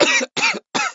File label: cough